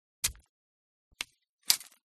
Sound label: Knife Flesh Twist Out; audio hero